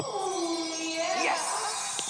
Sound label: Ooh Yeah